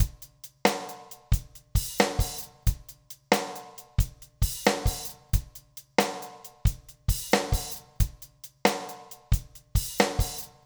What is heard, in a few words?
090 Pop Drums; Pop Rock; Moderate Tempo; Pro Tools Essential Loops; Digidesign